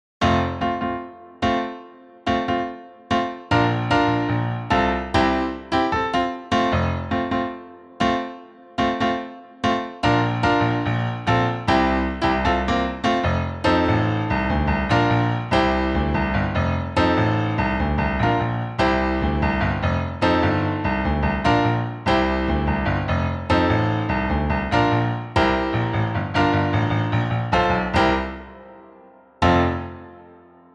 Accompaniment Only

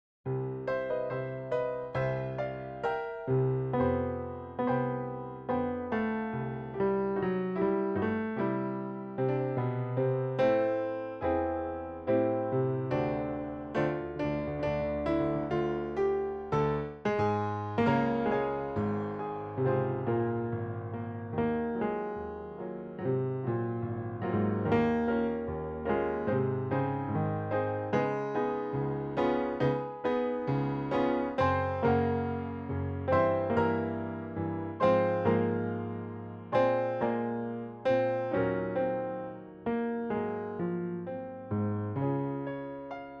plunk

